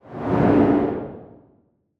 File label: Short Wind Whoosh; Whooshes